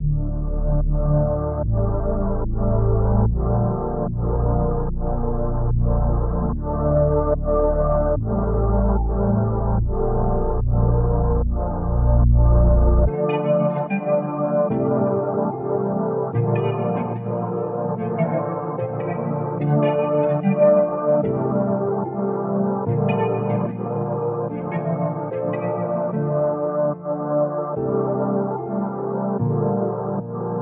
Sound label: 147 BPM D Min, Melody; Cymatics; 2022 Melody USB Expansion